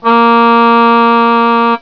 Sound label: tone